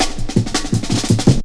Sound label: Pride Cut, Drum Loops, 165.5, Jungle